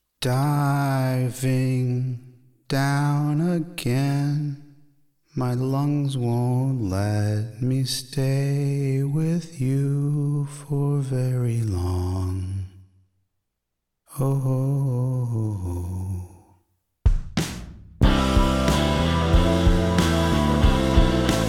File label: Underwater Ocean